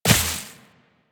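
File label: Step Vegetation, Footsteps, Walk; Warframe; Tenno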